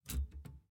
back flick rail

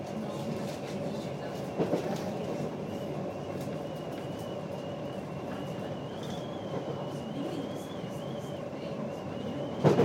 whistling kettle on this tube train

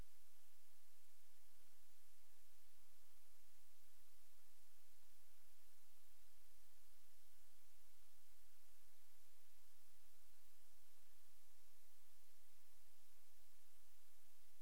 noisy bus

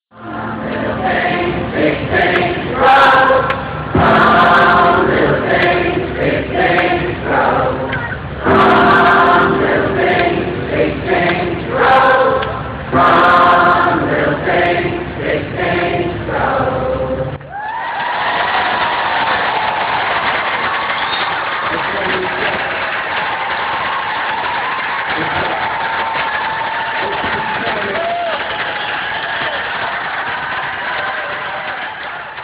brisbane crowd sings; national day of climate action

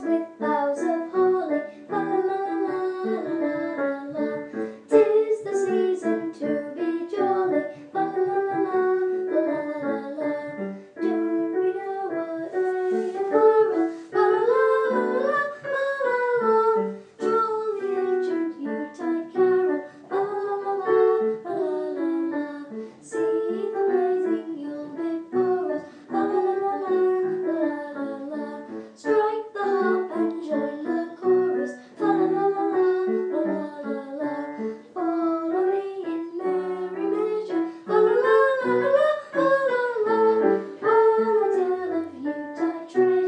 deck the halls sung by my kid

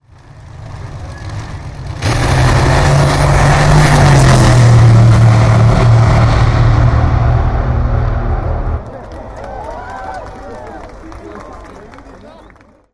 top fuel dragster; santa pod june 2012

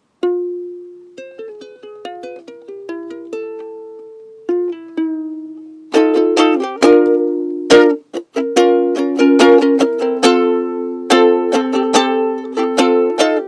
ukulele

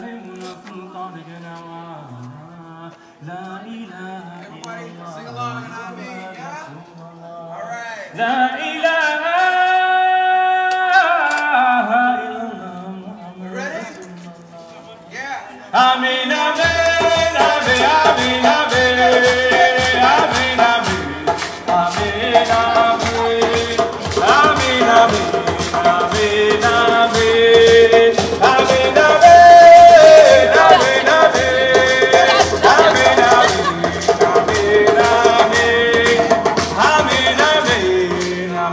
musicians at stockwood park luton

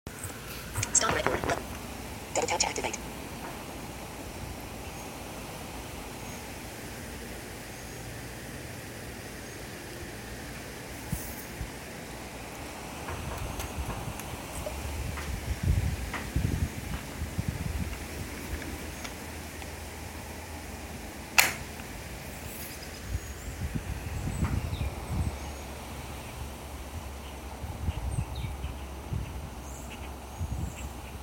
back porch ambiance

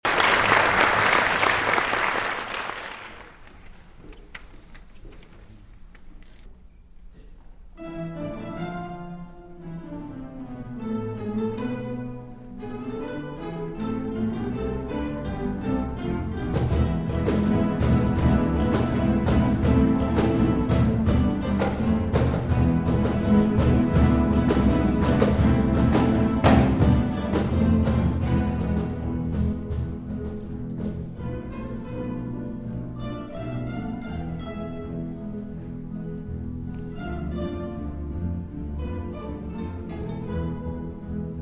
a whole lot of guitars

